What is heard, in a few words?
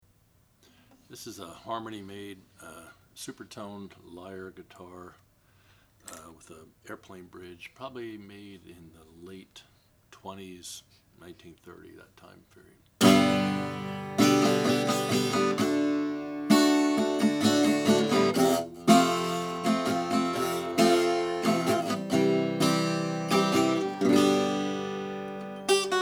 harmony lyre aero vitatone natural